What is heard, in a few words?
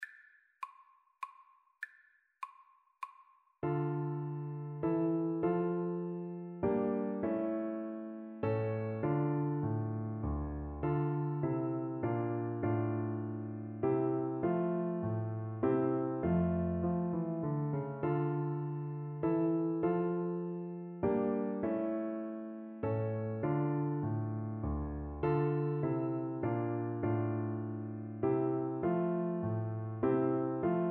bach minuet in dminor viola